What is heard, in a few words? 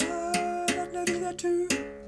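86 high berimbau phrase; Roland LCDP11 Africa VOL-1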